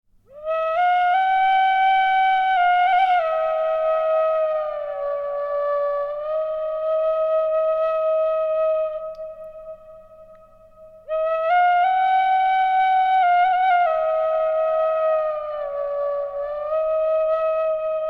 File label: irish tin whistle d reverb